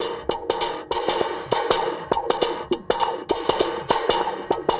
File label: Spring, Percussion Loops; Ambient Grooves